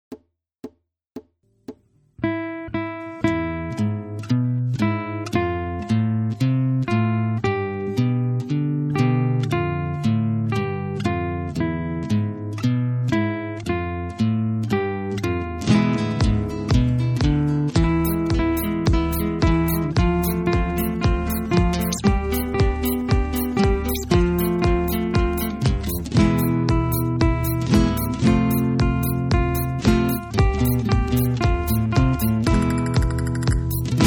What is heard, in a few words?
Flamenco